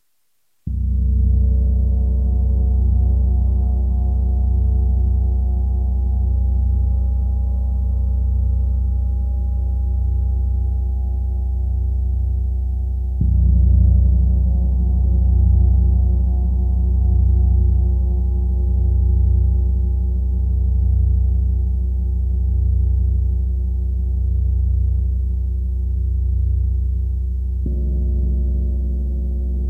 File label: gong